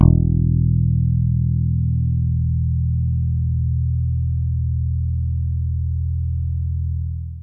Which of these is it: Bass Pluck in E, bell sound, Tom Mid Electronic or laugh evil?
Bass Pluck in E